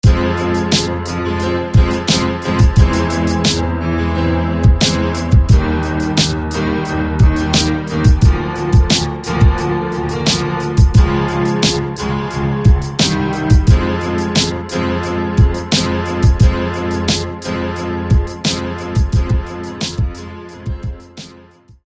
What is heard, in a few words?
Corridor Keys